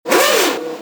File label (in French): Formule 1